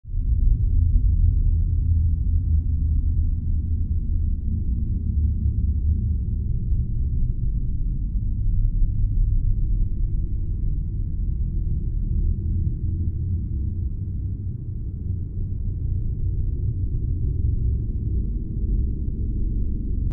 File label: Sci-fi soundscape loopable desert planet